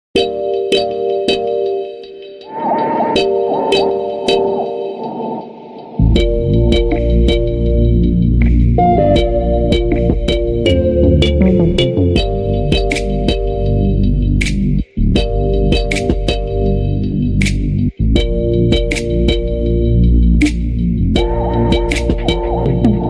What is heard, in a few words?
Instrumental Version